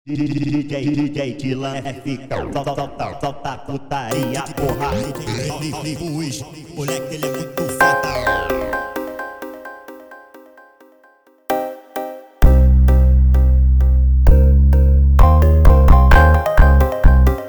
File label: Funk instrumental